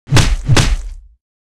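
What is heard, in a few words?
Mob attack; Monster; 묘족; 가가공주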